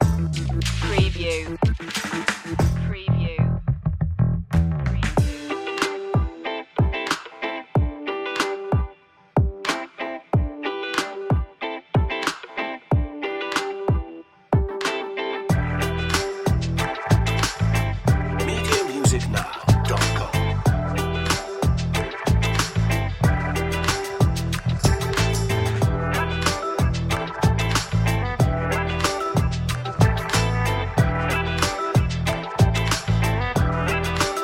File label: cool funky groove